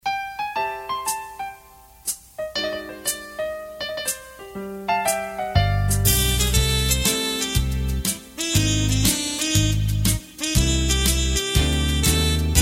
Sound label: music